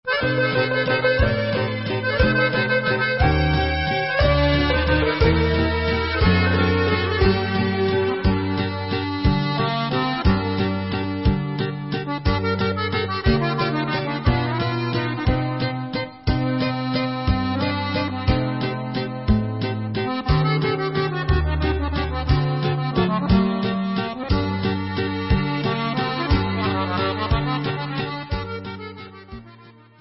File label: Vals, Instrumental